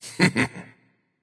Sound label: heh heh; smite; xingtian